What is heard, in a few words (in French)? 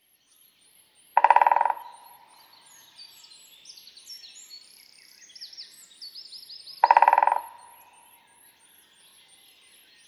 pic epeiche; oiseaux de nos forets